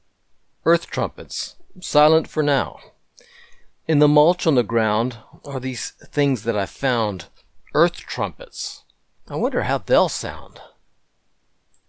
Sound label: Earth Trumpets